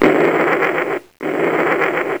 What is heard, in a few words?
blast forcefield, LazerTag